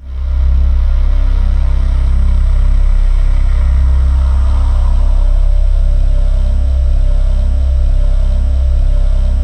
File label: PAD8 C2; Explosive Jungle; USB Soundscan vol.05; AKAI